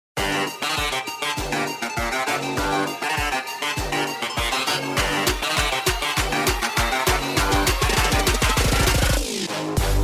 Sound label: Trap Hip Hop